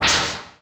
Transition Blast Short Hit; Production Element Title Transition

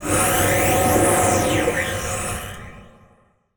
Transition Whoosh Long; Production Element; Title Transition; AdobeCC

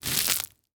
Industry Tool Rope Twist And Scrunch Short

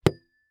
Impact Blanket Hit By Thick Metal Tube

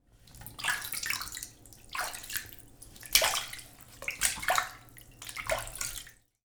Human Male Washes Face In Sink

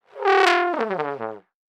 Cartoon Trombone Elephant Sound; AdobeCC

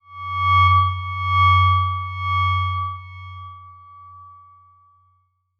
Transition Bell Warble; Adobe; Production Element

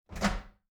Household Oven Door Open

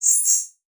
Multimedia Internet CD-Rom Flash Element

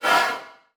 Multimedia Internet CD-Rom Flash Whoosh Short

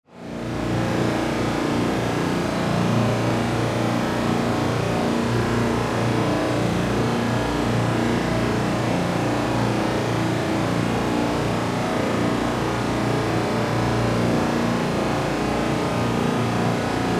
Drone Digital Feedback Loop; Production Element Imaging; AdobeCC

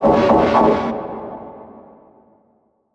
Transition Blast Pulse; Production Element Title Transition

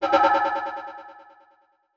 Title Transition Delay Short; Adobe; Production Element

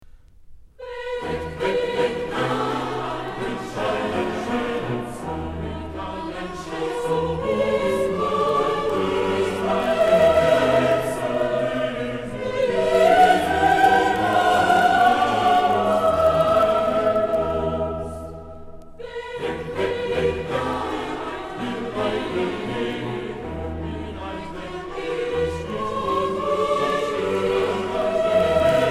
Bach Motet; Bachchor Stockholm; BWV 227 VII

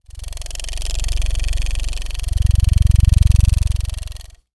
Industry Tool Motor Small Motor Run

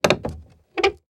Industry Tool Nail Pull From Wood Squeak

